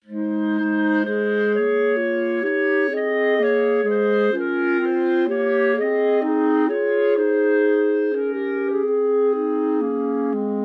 Old English, Chamber Music for 3 Clarinets, complete version